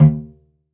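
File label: SOLO CELLO, Synclavier Strings; ILIO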